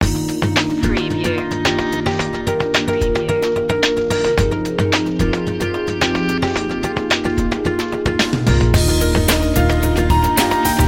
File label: bright and corporate